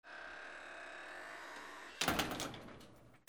DOORS

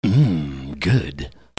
PEOPLE

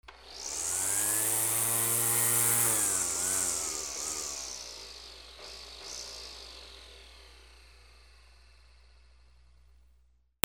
GARAGE

